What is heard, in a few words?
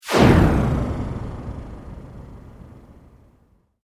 Sweep Mechanical Medium